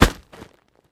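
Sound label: Heavy Bag Hit, boxing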